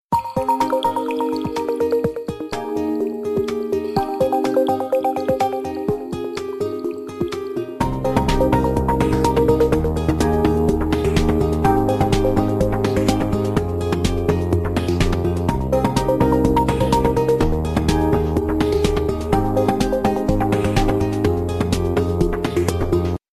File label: samsung ocean sound